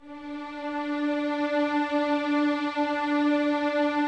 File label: D 4, 11 Violins, Soft; Miroslav Vitous